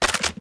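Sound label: equip movement; valorant; rgx 11z pro vandal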